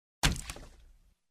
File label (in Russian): v graz litsom